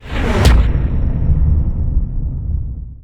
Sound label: rain of chaos buildup; warlock